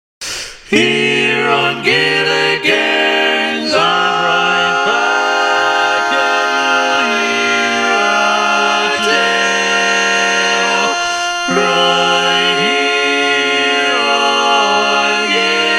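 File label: baritone, barbershop tag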